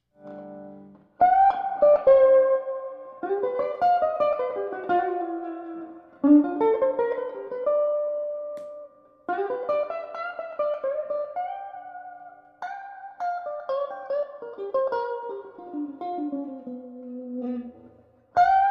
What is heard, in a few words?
cone cry